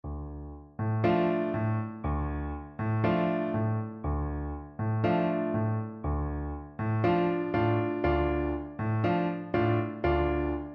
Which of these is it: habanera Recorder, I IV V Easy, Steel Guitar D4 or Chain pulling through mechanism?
habanera Recorder